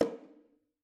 Bongo Hit Muted, Struck Membranophones; Bongos

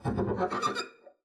arco noise jumpy, double bass; dsmolken; noises

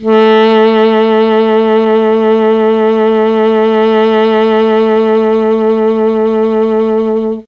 A1 alto sax, mellotron; CHMB ALTOSAX

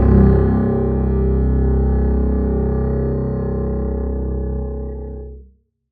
super c pff, double bass arco; dsmolken

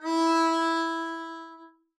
arco e4 f up, double bass; dsmolken

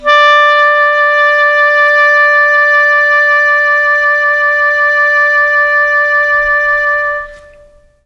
D4 clarinet, mellotron

